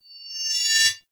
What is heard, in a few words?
Cannister Throw Riser; Enemies; Abilities; Warframe; Tau; Dax Archer